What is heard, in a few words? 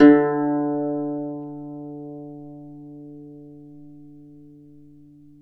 Celtic Harp, Plucked Strings